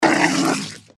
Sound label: gecko snarl; npc